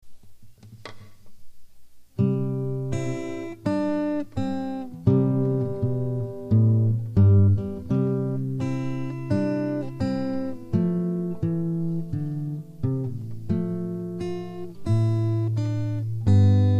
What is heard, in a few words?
JINGLE